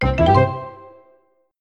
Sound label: menu